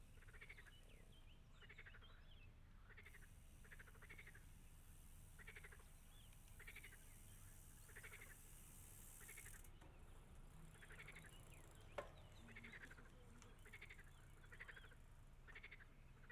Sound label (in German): Froesche; Lexikon-Roman